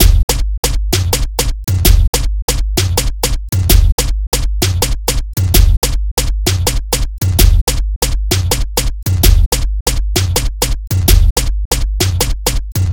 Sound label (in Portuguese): Beat